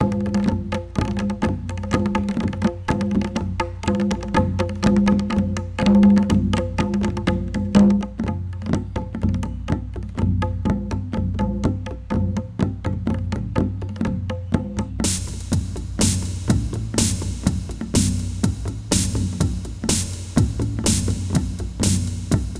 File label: Tabla Run